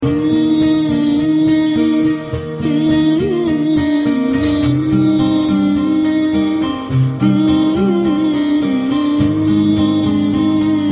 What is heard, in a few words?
Humming